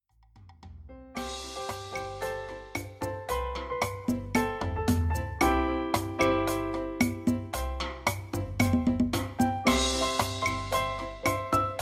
Danzon

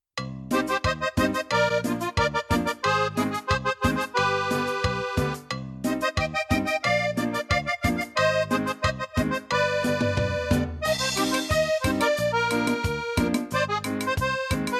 Cumbia; ritmos

